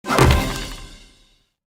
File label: Jingle Reward Disappeared